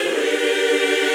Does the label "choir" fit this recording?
Yes